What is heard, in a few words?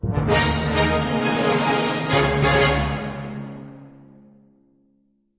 Heroic Music Flourish